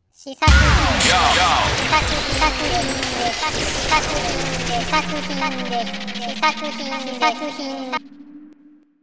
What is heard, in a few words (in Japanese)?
効果音